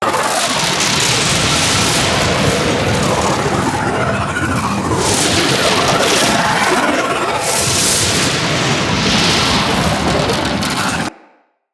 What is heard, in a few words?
Void Tear Loop